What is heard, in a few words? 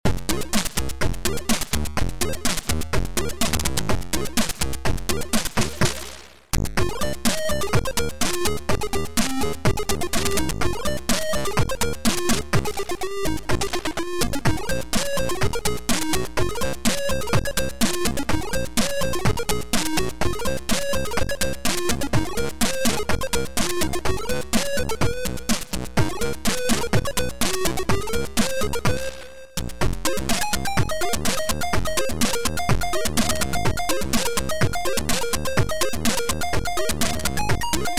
Old Tower gameplay tune